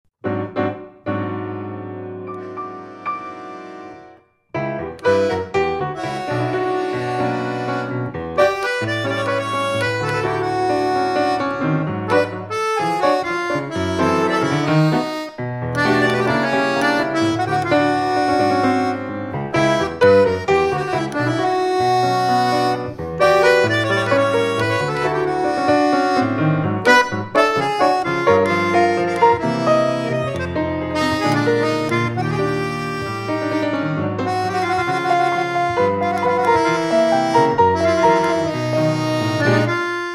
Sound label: Finnish tango